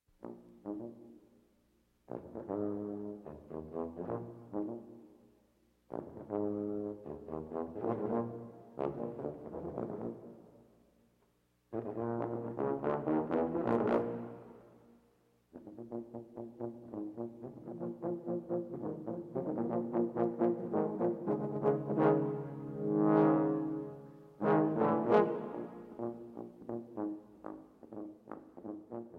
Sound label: Tubas Rock